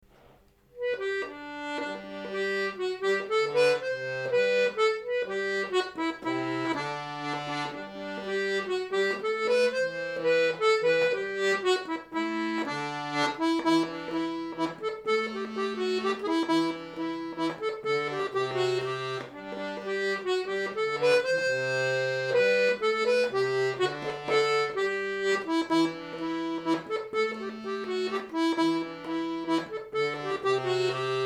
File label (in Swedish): melodi ackord; Sol gar upp